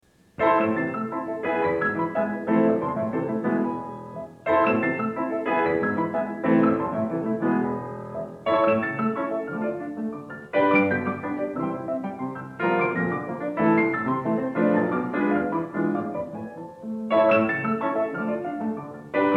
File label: Variation VIII - Allegro; MICHELANGELI; BRAHMS; Variations on a theme by Paganini, Op. 35; Book 2